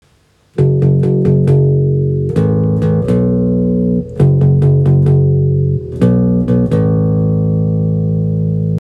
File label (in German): Aufnahme Plektrum